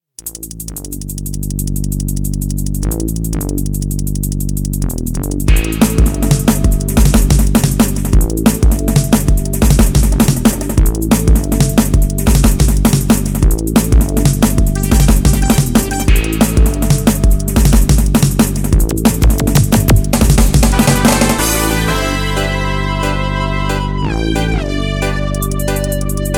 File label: crystal sound